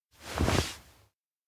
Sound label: shrug; anemoi